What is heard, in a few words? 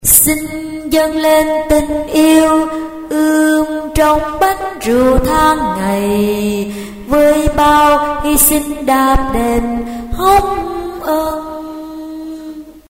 tenor